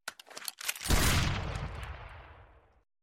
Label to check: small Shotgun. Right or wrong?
Right